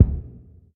solid kickdrum sample d key, Royality free kick drum tuned to the D note.